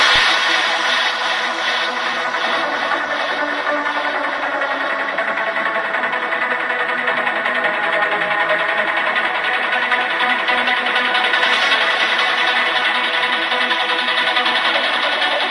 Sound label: hardcore trance